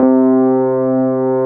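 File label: Wurlitzer C2 - R; USB Soundscan vol.09; AKAI; Keyboards Old School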